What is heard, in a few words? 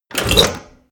Shaft Un Lock